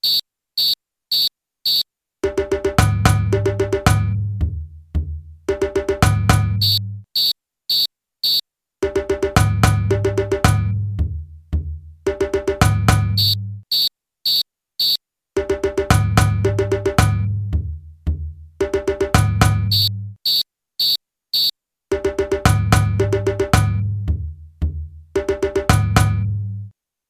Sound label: Djembe call response